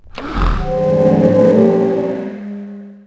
Call, Dino; Para

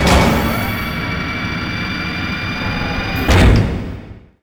trailer; oklahoma

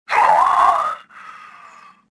death; Star Wars Jedi Knight II Jedi Outcast; XBOX; shadow

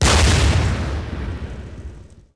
explo laser hi; impacts explos

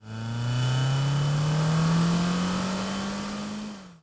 car rev; Matt Hoffman's Pro BMX 2; XBOX

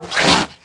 Large Spear Tail, Aliens

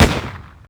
shoot generic, weapons; Max Payne

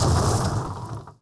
coll ship 2 rock; shipcharge; Pirates Of The Caribbean

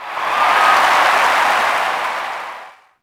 Cheer, Crowd, reactions

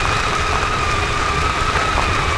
tram engine loop; auto; Tony Hawk's Project 8; XBOX

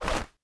BUZ FLP

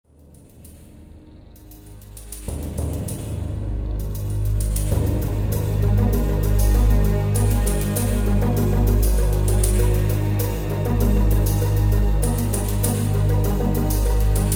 slow, music; Soldier Of Fortune II Double Helix; XBOX; prague

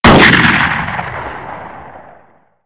12 Gauge Shotgun Fire; Weapons

